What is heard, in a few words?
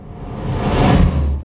Reverse Slam; Zero-G; Soundclash Nu Dance Electro; Acidized Wavs; FX Atmospheres